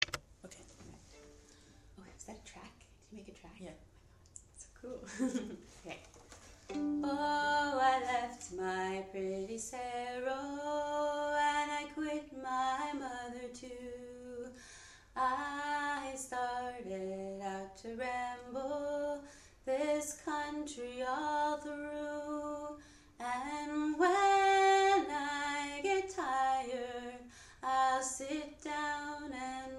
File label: Pretty Saro harmony voice